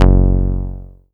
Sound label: Rubber Bass; Best Service Gigapack 2